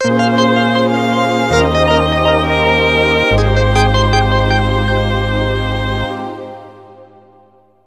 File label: Jingle Gameover; Cake Invaders; PS4; Xbox Series X|S